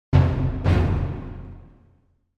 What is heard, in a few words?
Symphonic Hit Drum And Strings Finale - Drum First; Hollywood Edge; sound designer's toolkit v2